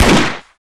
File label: Deagle